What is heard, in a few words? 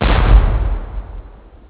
explosion sm; antaris